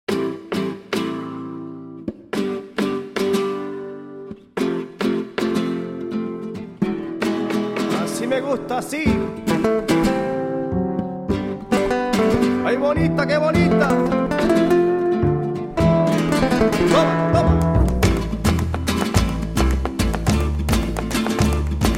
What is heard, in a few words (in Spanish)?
Flamenco; music on hold samples